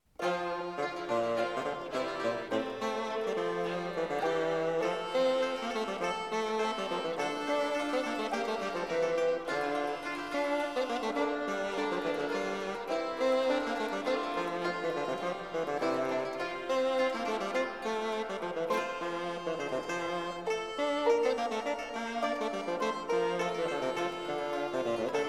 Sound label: Instrumental